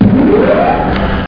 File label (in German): TUER